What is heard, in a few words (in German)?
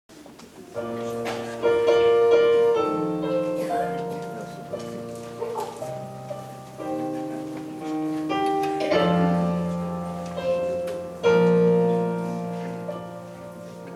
Weihnachtsglocken